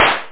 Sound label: Gunshot; F1 Licenseware - Volume 1